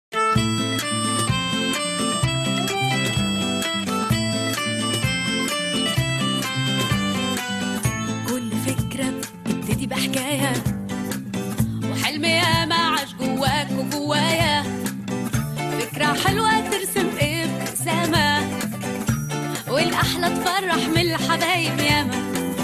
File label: melodious ringtone, iphone ringtones